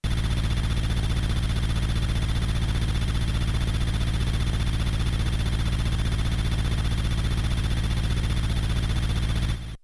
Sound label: Helicopter, SCC+ Stereo; Hyper Sonem OST